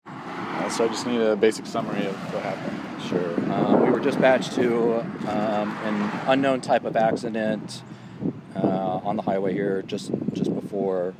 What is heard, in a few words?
crash